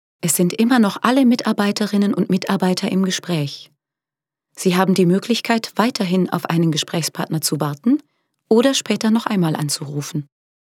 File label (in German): telefonpaket warteschleife